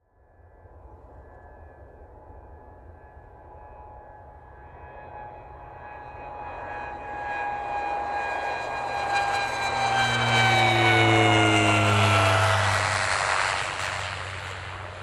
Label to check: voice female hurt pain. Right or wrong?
Wrong